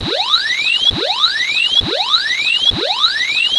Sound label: flag pulled; unreal tournament 2004; fav UT sounds